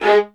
LDVLN1 ATT G, LOUD VIOLINS; Q Up Arts; Denny Jaeger Master Violin Library

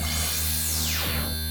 DRUMMM FREAK, DRUMS + PERC; Rubber Chicken Software Sample Bank

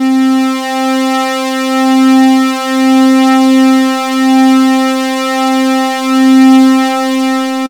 Synth, Raw Analog Multi's; Dream Zone

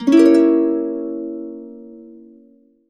HC EDIM7, Complete Harps; USB Soundscan vol.62; AKAI

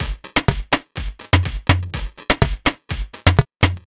124 Wubblefunk Perc, Drum Loops; 124bpm; Nu Dance Electro

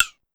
Conga Slap Down, Pitch Env; Clavia DDrum-AT; Pitch Env Various

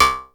SPACE TONE; E-MU Formula 4000 Series Vol. 2; Effectors

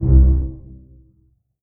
string pizz C1 pp; Sound On Sound - Peter Siedleczek's Orchestra

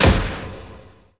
METALLTU; Best Service; Advanced Mega Effects

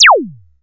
ZAP KIT C4; AKAI; USB Soundscan vol.20 - Fresh Disco House I

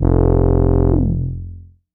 bass F1, Korg MS-20; Polyend; Simulacrum Sounds; 1 Shots; Synths

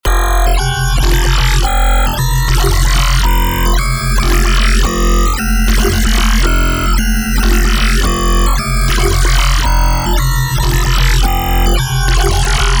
Cyber Bass Loop F

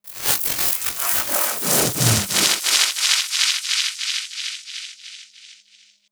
Static Charge; Zero-G; Soundclash Nu Dance Electro; Acidized Wavs; FX Atmospheres

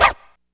DR660 DANCE, DRUMS PERC

